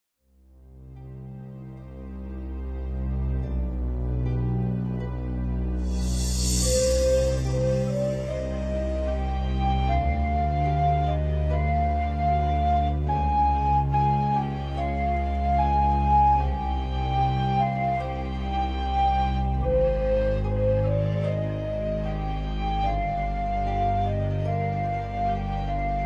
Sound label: sad music